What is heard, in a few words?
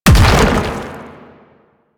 Hand Bomber Proj By Explosion; Warframe; Man In The Wall